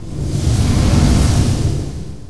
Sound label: fire hit; DAoC